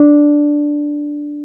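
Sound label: piano Wurly D4; Keyboards of The 60's and 70's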